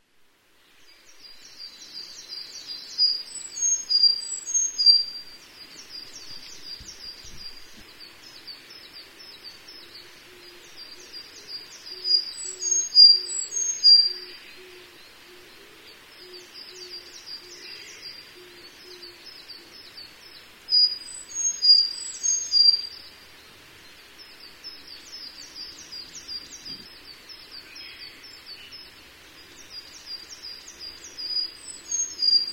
ezomushikui; エゾムシクイ; さえずり

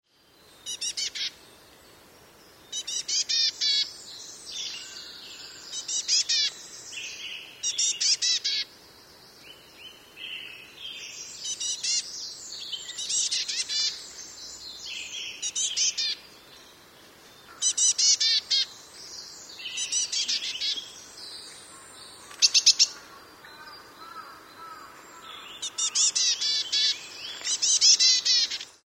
kogara; コガラ; 地鳴き; 2014年5月6日 静岡県富士宮市

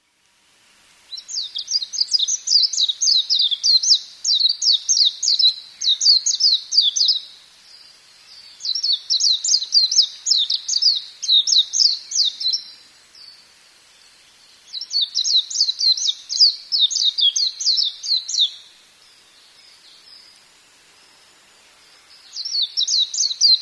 mejiro; メジロ; さえずり; 2022年4月23日 静岡県富士宮市

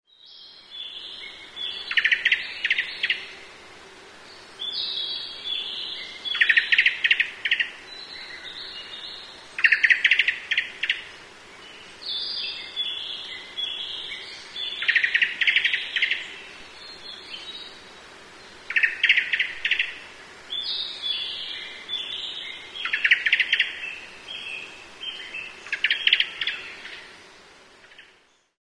kurotsugumi; クロツグミ

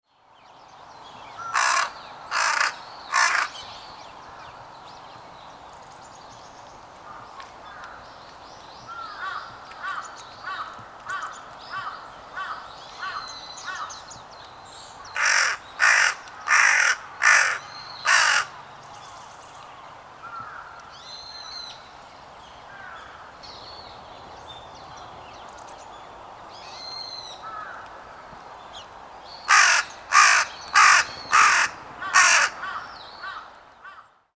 hashibosogarasu; ハシボソガラス; 地鳴き（バックにハシブトガラス）; 2025年12月31日 神奈川県横浜市

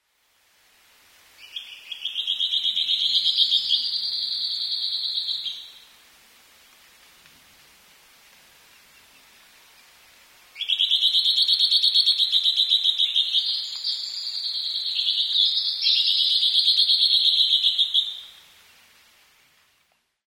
juichi; ジュウイチ; さえずり

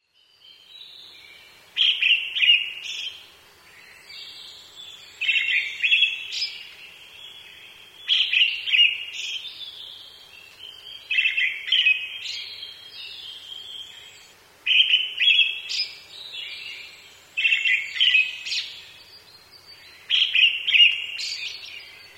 akahara; アカハラ; さえずり; 2018年7月14日 静岡県富士宮市

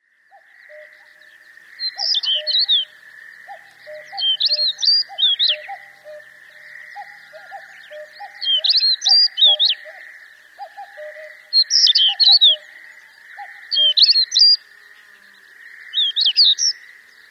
nobitaki; ノビタキ; さえずり

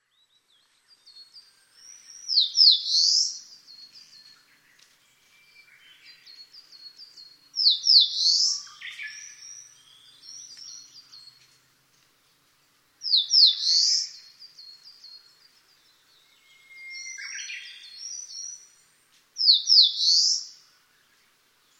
sendaimushikui; センダイムシクイ; さえずり; 2005年5月28日 愛知県豊根村

